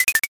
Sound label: bone